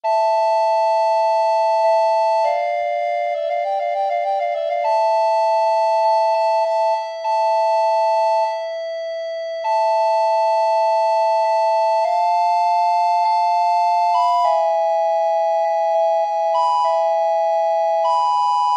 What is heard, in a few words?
recorder duet